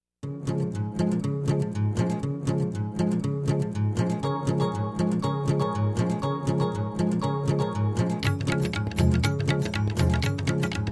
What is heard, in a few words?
Jingle Bells